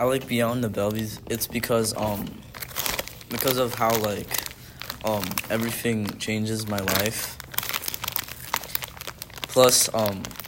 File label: paper crumbling; voices of impact